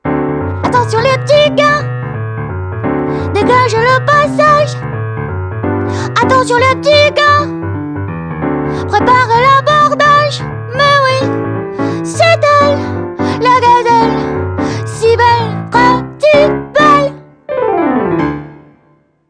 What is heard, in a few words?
boogie chan; MOBICLIC 57